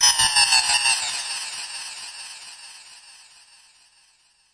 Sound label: Electro FX; Ueb HE Electro FX